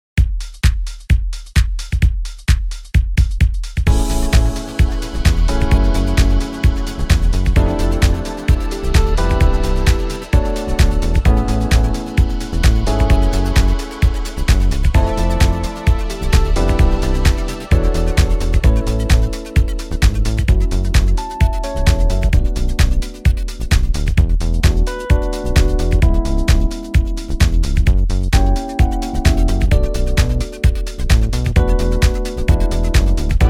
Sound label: A smooth